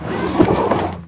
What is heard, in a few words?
Auto Tires Peelout Ice; Automobiles